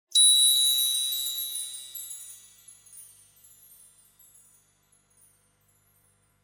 blesk magicheskij

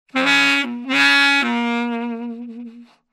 nasmeshka saksofon

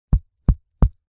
postukivanija bez jeha

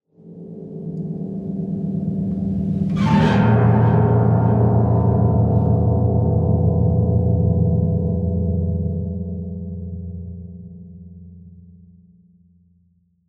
Ochen strashny zvuk arfy

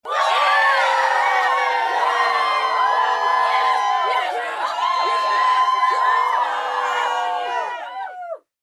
vizg i kriki

